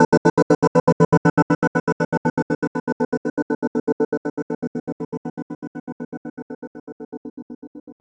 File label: Guitar FX, Stringed Instruments, World Pop; Guitars; Sonic Foundry (Sony Creative Software)